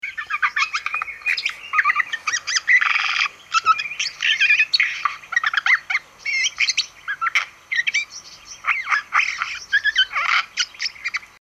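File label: jay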